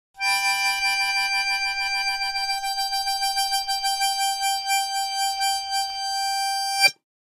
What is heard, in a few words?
Mouth Organ Vibrato, harmonica; woodwinds